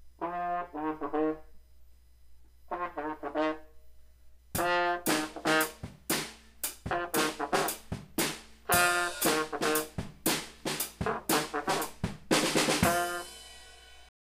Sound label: funky